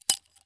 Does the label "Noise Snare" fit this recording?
No